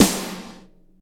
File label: long steel snare drum sample g key; snare drum samples